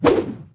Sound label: blunt attack; matmos interactions